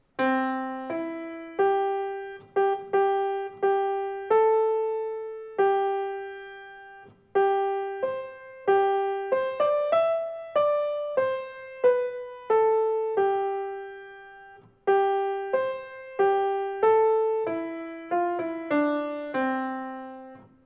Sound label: MELODY; Sleepers Wake